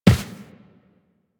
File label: Step Default; Warframe; Tenno